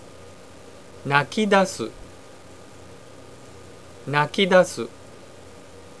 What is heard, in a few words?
start crying; japanese